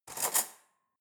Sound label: cutlery fork drawer handle grab